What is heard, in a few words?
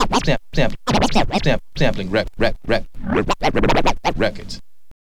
scratch med, Vinyl Attack scratches mix; Masterbits